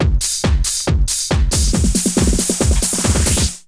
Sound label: Essential beats